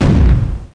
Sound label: fire w; CD Action